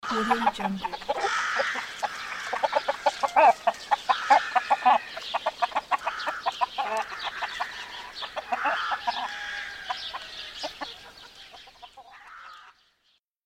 chicken ambience; audioheart

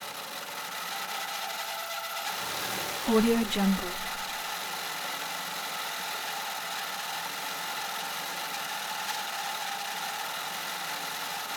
singer sewing machine squeal; collanderson

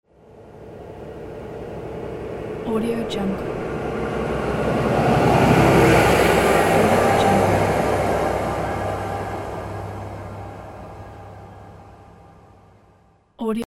truck; n-audio

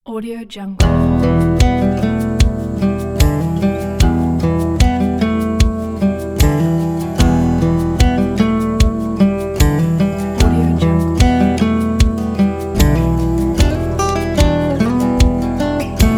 western loop

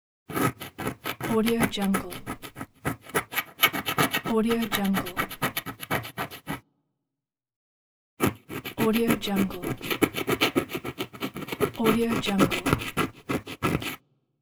scratching lottery ticket scratch card

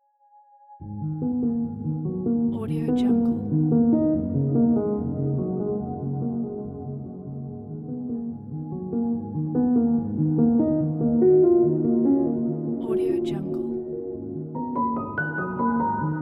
ambient piano documentary

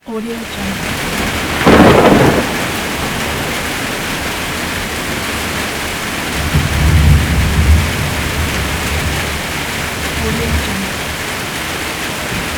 rain with thunder

